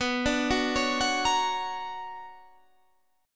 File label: B Minor Major 9th B Guitar Standard, chord; BmM9 arpeggiated; JGuitar